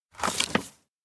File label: take hairpin kids drawing; anemoi